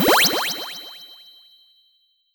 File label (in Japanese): kaifuku